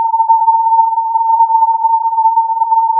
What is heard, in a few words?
filtered input